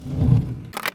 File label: Slide Click